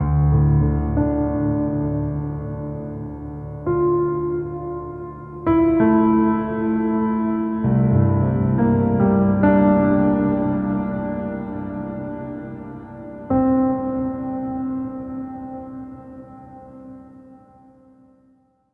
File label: Piano Theme; Sonic Foundry (Sony Creative Software); Numina II